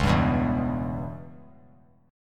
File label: C sharp Minor 7th Flat 5th; C#m7b5 strummed; 13,14,14,14; JGuitar